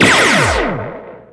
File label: synth laser; Earth and Beyond; EA Games